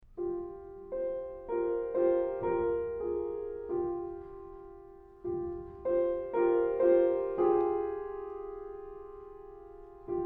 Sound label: piano pieces, sad, prayerful